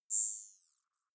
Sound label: zoom out light high